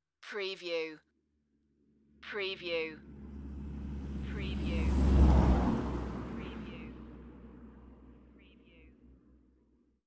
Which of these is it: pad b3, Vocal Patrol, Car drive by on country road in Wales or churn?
Car drive by on country road in Wales